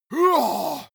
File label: monster growl attack; schreie